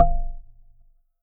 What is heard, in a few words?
collect; grunk; plunk sound for grunk collection